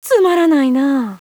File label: cough; svencoop; player sounds; lappland